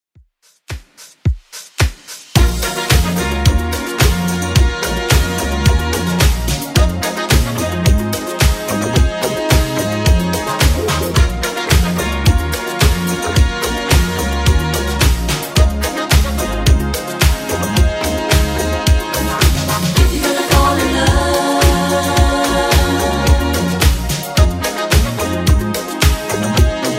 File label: 80s Redrum 109 bpm